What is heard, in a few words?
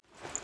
archer powershot start; HighGroundRoyaleNetcode